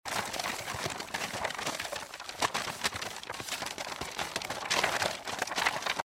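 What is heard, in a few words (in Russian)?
роются в бумагах быстро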